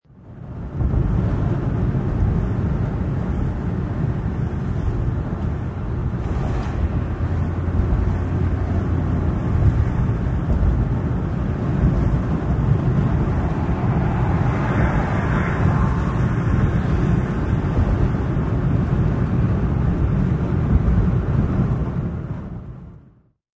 Wind Thru Car Window, Wind blowing through a car window at high speed; Cars; Vehicles